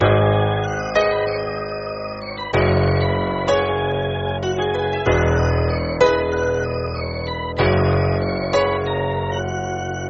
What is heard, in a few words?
sample melody